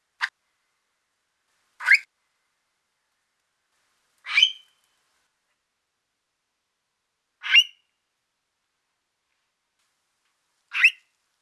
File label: call, Stone curlew, captive chick; Burhinus oedicnemus; CRASM